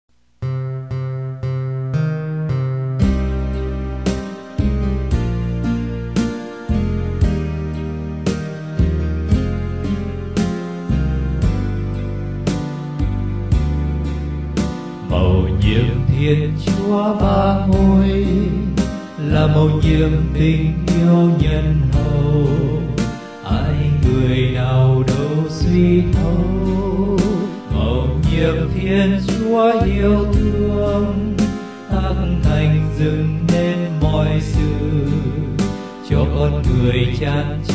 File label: BASS